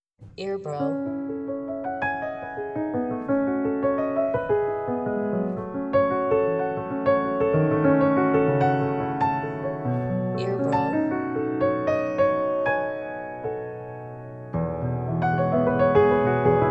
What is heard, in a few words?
Piano, Debussy Arabesque